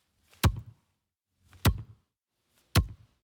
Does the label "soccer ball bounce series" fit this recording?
Yes